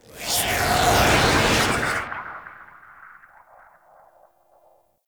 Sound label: Temple Assassins Spawn; Warframe; Orokin Derelict; Red Veil Temple